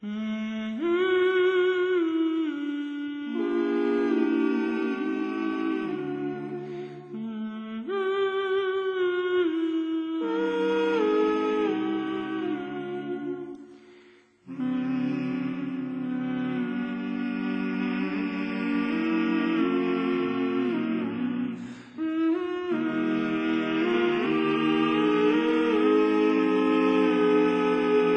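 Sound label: Music Ambient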